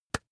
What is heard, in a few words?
magazine tap hard